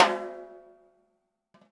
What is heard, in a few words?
DW Green Maple Timbali; Sonic Foundry (Sony Creative Software); Vital Drums